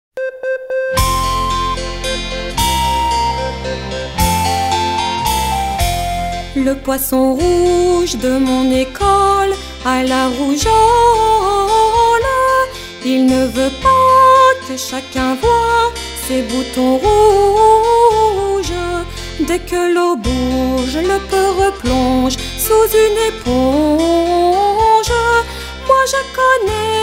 poisson rouge chant

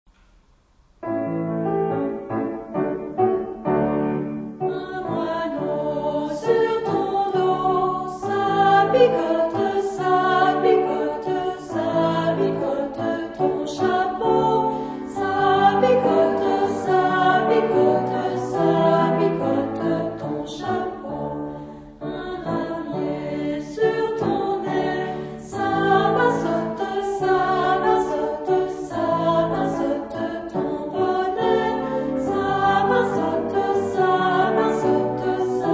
moineau chant

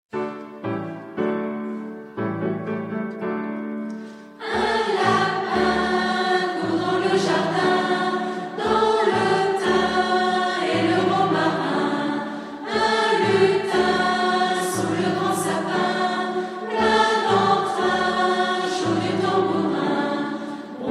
lapin chant

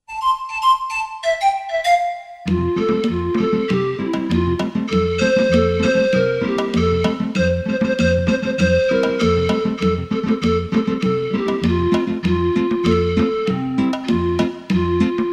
petits souliers instr

